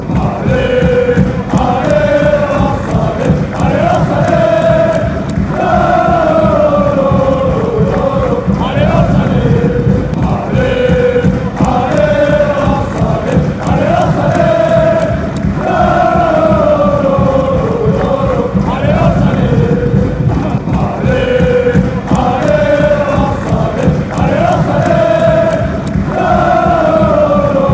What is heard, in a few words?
ooooh come on lens come on